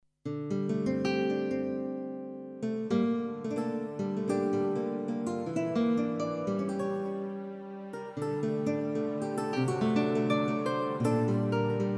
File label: orchestral